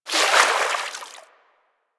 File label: Hoverboard Terrain Caster Fluid; Warframe; DE; Vehicles; Venus